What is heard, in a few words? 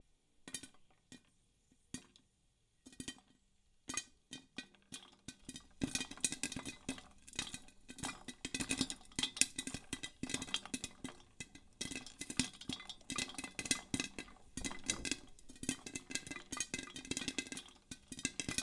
Popping Popcorn